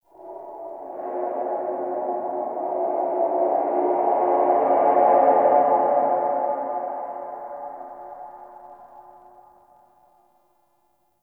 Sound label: Eerie Metallic Moans; Warframe; Tau